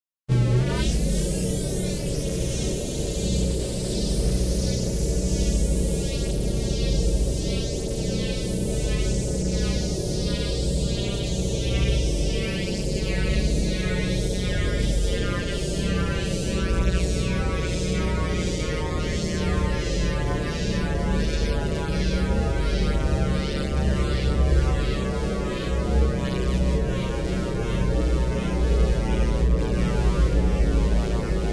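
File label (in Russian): электронная энергия запуск выключение вращающееся действие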